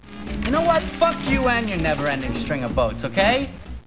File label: String Boat